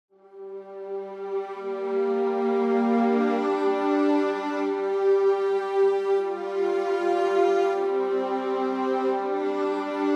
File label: String Ensemble